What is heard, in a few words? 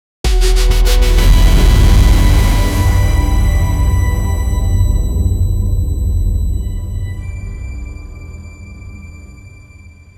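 epic futuristic end credits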